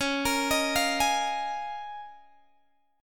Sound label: C sharp 6th Add 9th, Guitar, Open G